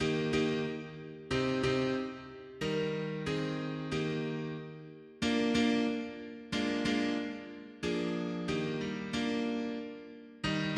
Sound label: mono piano; hymns; Himnario grande